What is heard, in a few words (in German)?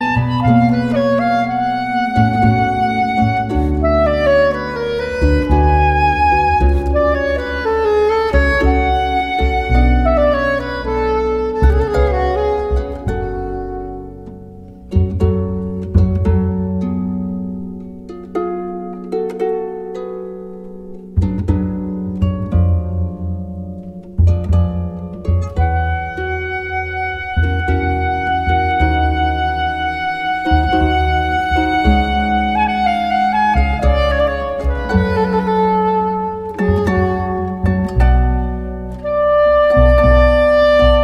oster oratorium adagio sopransaxophon